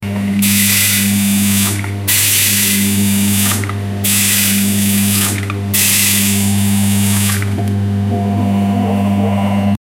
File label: milking machine alternati; farming